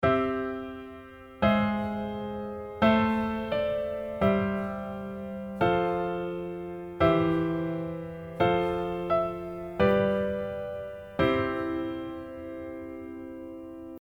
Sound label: diatonic